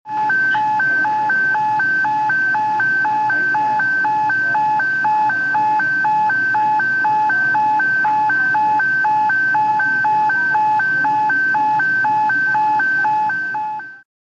HOSPITAL EQUIPMENT HOSPITAL: Intensive care unit, TCM alarm, with background. Transcutaneous Monitor.